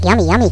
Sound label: eat; gcompris; gnumch equality